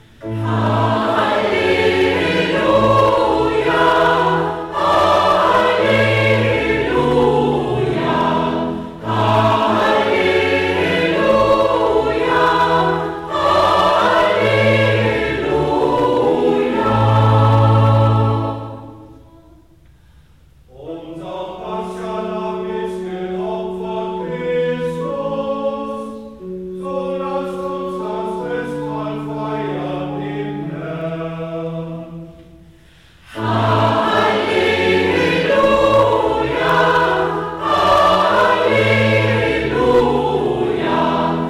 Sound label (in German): Chor Halleluja